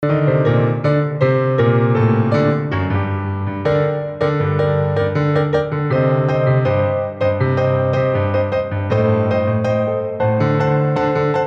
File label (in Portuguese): arranjo